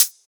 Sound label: Closed HH; Vengeance; Essential Clubsounds Vol.4; Cymbals; Closed Hihats